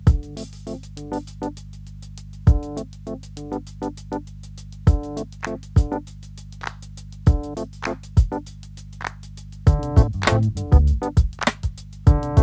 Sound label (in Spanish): Master aumento del ruido